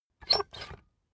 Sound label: puncher squeak punch